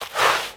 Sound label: Crouch Move Foley; Warframe; Characters; Tenno